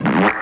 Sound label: Cartoon Raspberries, vocals